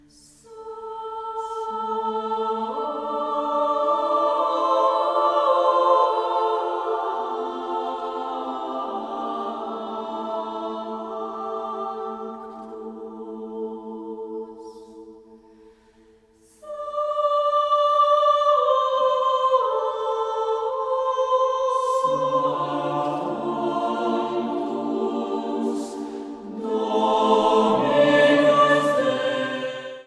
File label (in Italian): voci